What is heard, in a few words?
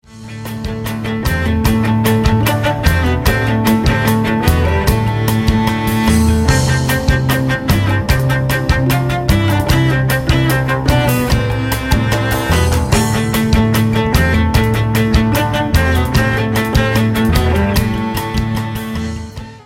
Tension